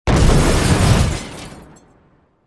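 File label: Explosion Glass Debris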